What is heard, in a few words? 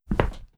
Step; LuckyPunch; ClubFusion